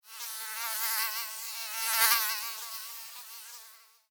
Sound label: Mosquito Fly By, Mosquito, Fly, By, Wings, Insect, Bug; Mosquitoes; Animals:Insects; Blastwave